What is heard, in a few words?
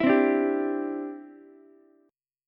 D 7th Suspended 2nd Suspended 4th D, Ukulele; D7sus2sus4 strummed